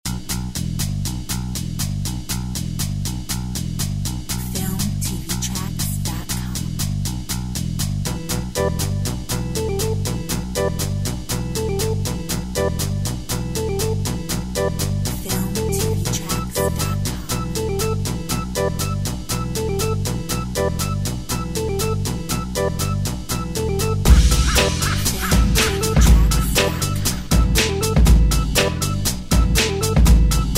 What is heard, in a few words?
Exclusive beat